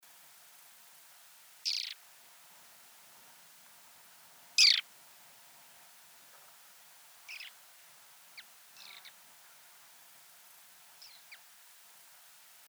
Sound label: Caspian Plover Charadrius asiaticus, caspian plover call